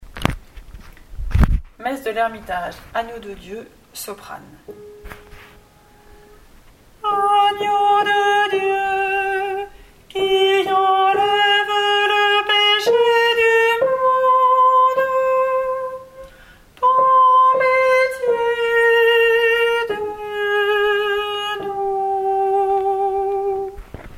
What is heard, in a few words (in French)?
SOPRANE; Agneau de Dieu MESSE DE L ERMITAGE